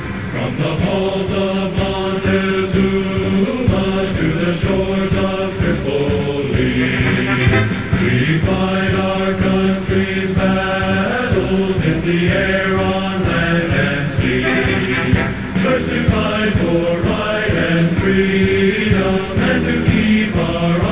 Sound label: marine hymn vocals